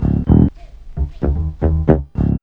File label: B LOOP